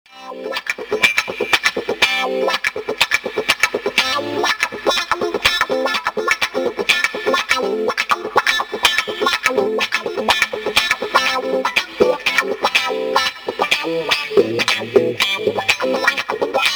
Fulltone Cryde Wah Deluxe, effector

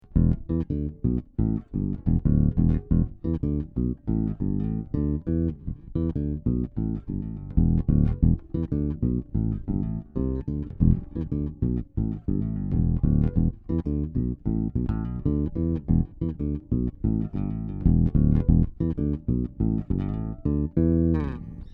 Octa Bass, effector bass

